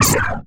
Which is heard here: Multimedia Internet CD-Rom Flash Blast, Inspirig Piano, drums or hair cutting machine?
Multimedia Internet CD-Rom Flash Blast